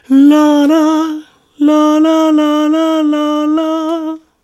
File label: La La; Yuga; Zelda